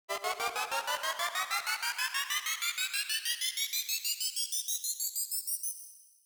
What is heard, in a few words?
fast stutter